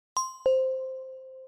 Didong; Lenovo; S660

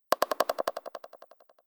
notification; Allview Soul-X6-Xtreme

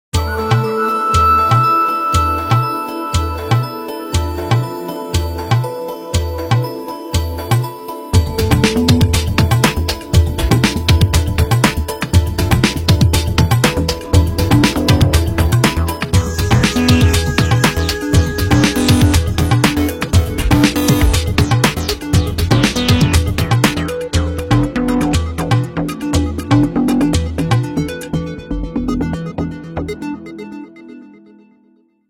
Droplet, Nokia; N86

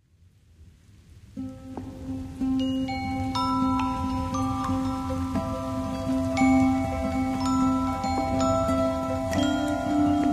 Raindrops, alarms

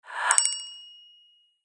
Email notification; Rise 54; Orange

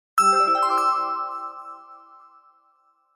Message, Nokia Alert tones

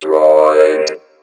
Droid; Motorola; Droid 2 R2 D2

